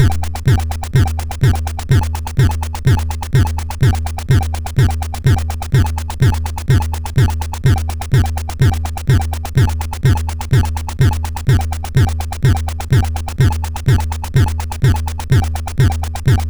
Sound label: Acid Power, MOS6581R3